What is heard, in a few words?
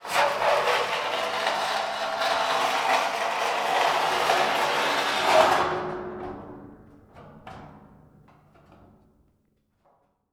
Corrugated Iron Sheet; 99Sounds; The Warehouse